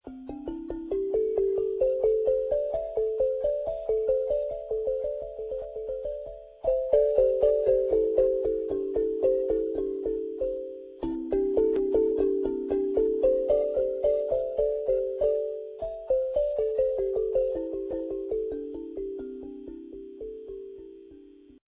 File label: stonaphone